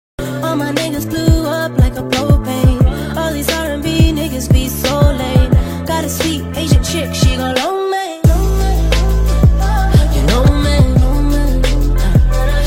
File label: weekend reminder ringtone